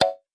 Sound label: favorite unclick